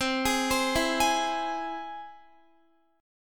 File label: C Augmented down Guitar; arpeggiated